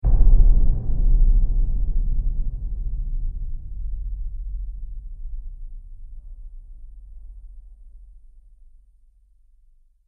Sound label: Drum Hit Trailer; sound design